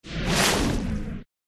Water Spirit

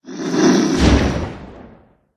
zombie attack voice bottom

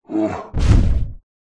Bear Die

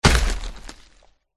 dead pirate boss attack contact up

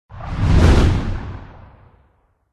panther

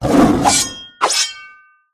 tiger

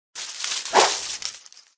forest spirit attack voice bottom

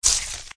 dragonkin attack contact middle

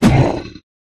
troll dodge

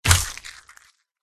bat attack contact

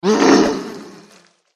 zombie attack voice up

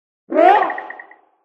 dragon mage attack voice middle

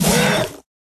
Wild Flower Wound

